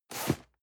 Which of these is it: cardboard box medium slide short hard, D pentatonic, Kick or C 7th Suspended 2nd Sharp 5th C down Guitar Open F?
cardboard box medium slide short hard